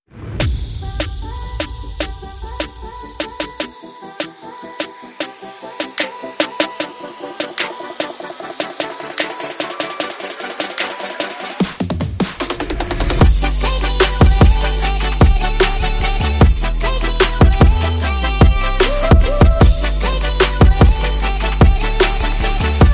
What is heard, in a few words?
Ethereal Vibrations